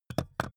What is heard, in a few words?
puncher move